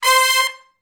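Hi Short Note (C) unison, Horncraft for R&B Sections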